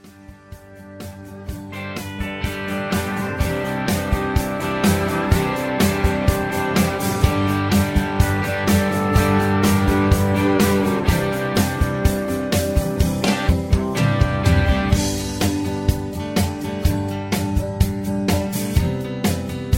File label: Instrumental With Leads